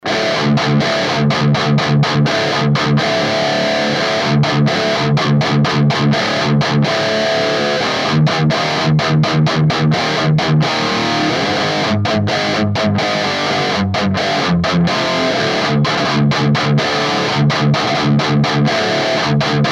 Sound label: HIGAIN